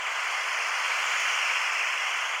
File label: Ball In Air Tangible Loop